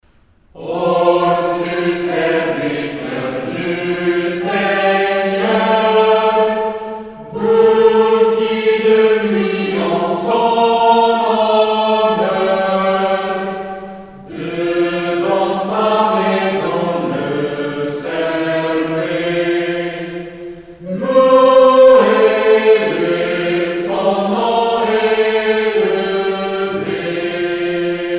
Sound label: Psalm 134 Or sus serviteurs du Seigneur metrical psalm; Loys Bourgeois